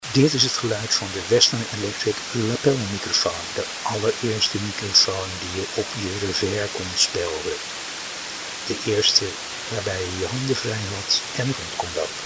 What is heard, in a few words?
Western Electric lapel sound